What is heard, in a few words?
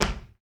Body Perc